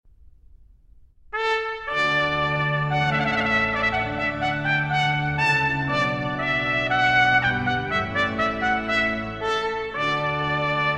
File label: Wedding Trumpet Tune